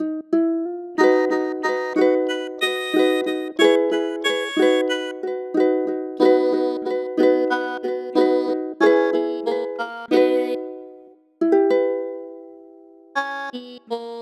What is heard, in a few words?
Song